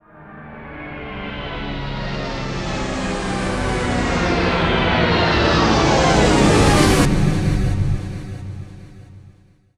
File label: DOWN FALL, Trance Fusion; ILIO